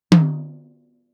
Toms; Touch Loops; CosmicFunk

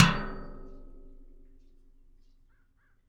hit, rust kit; Nucleus Soundlab; Ambient Drums

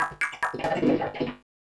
Industrial 140

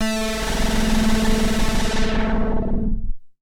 TEKNO 140, Techno; Sphere

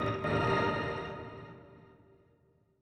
FX Industrial 125

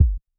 One Shot, Hits

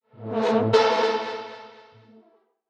C Synths, One Shots; Keys & Synths; Touch Loops; BlissedOutBeats

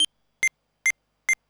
TECHNO EXTRA 140

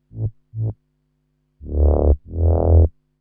SNTH BASS TEKNO 140 A

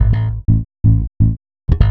HOUSE BASS 125 A

